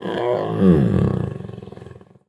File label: whimper